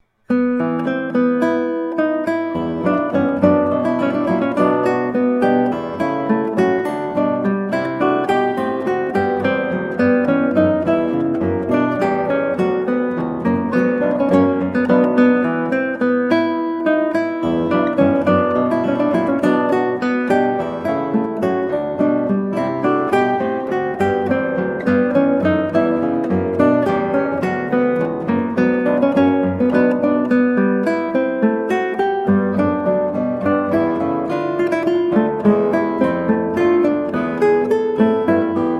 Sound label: Presto, guitar, spoken; Partita 5 in E Minor TWV41 E1; Georg Philip Telemann